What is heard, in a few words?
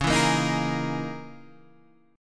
Db Minor 6th Add 9th Db down Guitar Open G